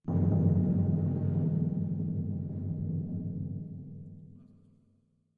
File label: Timpani Mallets Symp